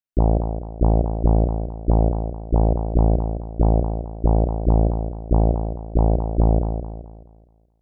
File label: HARD BASS 140 A, Hard Trance, Bass